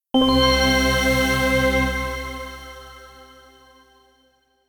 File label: Jingle Clear Bonus